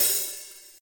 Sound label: soft hit finish